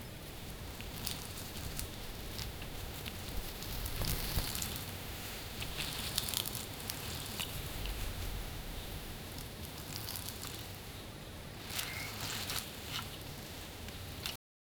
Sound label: the soft crunch of; healing